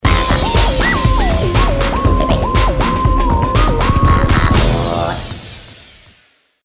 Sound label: Fanfare; Onua Fanfare; The Legend of Mata Nui; Console Games; Game Music